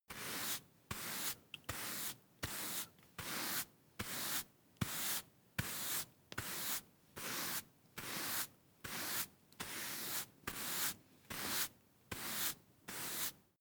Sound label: broom hand sweep sequence slow long